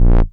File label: Bass Multi Rev B; Vengeance Samples